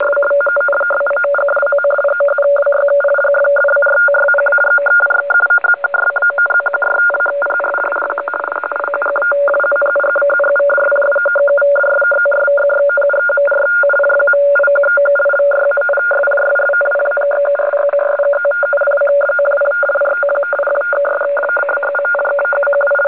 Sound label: RTTY